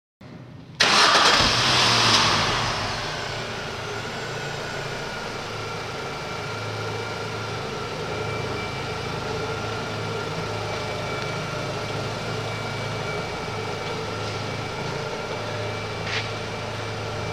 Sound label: Mini Park Lane Startup